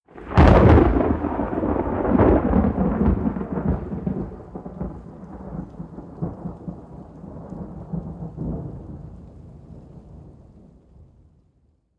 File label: Lightning